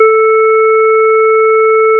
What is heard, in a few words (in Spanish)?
a4 clarinete cutoff 3000Hz